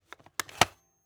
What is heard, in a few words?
polaroid camera open; mechanical